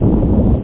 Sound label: STORM